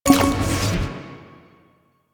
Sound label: Hack Minigame Click A